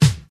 tight snare one shot g sharp key, snare sample tuned to the G# note; snare drum samples; one shots